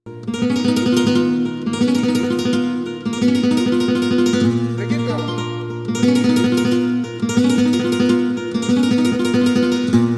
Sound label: Solea